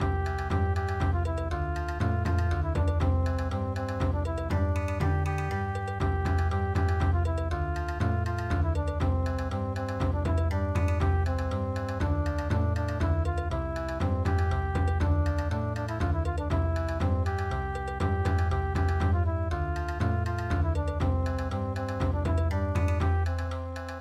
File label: German Festival Bon Odori